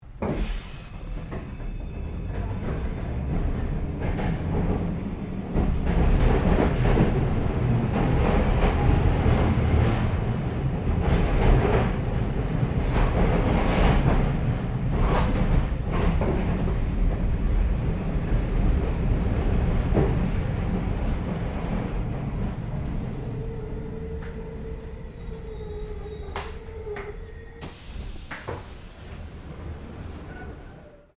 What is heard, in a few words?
Tram Melbourne W Class Start Stop Short; Streetcar; Streetcars; Vehicles